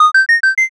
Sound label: sparkle